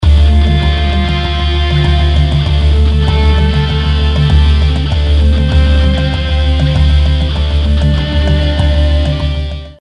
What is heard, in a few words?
Riff improvisation